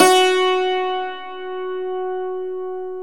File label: Dulcimer F#3; Stereo Dulcimer